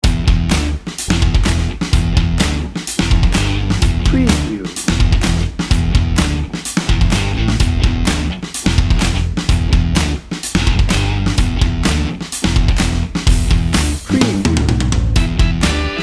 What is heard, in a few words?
bouncy bed